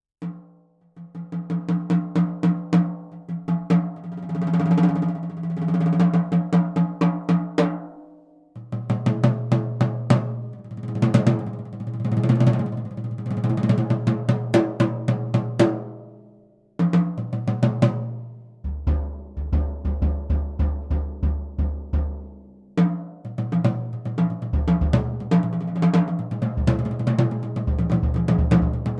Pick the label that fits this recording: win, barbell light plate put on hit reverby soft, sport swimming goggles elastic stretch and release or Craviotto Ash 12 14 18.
Craviotto Ash 12 14 18